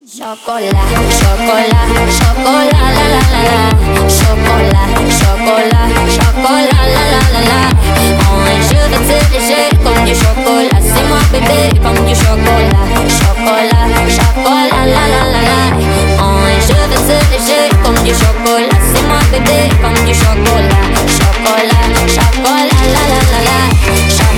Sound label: remix